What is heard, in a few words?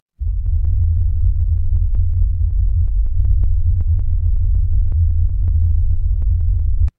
horror heartbeat